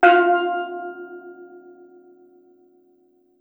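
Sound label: STEEL DRUM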